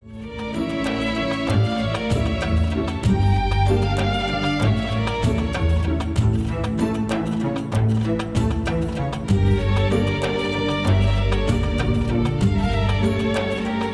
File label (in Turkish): cello keman; sicak nevale; tasacak bu deniz dizisi